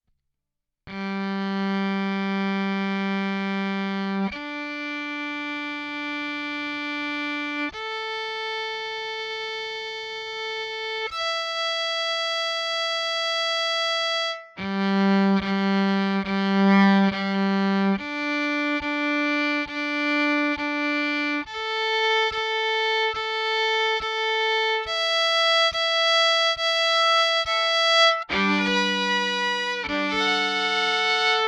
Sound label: pickup direct